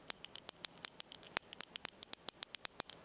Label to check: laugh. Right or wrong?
Wrong